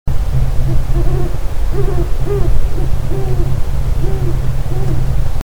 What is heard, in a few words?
great horned owl pair; natureNavigator